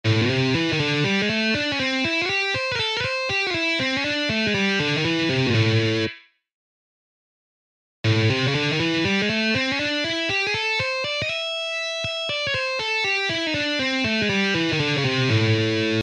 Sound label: Slide Exercise